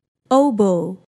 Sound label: oboe